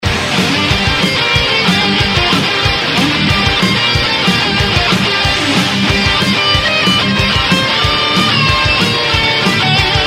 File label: tecnohard